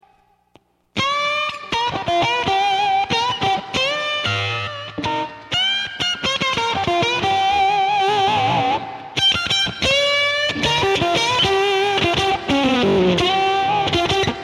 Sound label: octavia, pedals